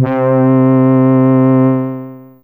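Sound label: Brass Ensemble C3, Fairlight CMI IIe; Brass1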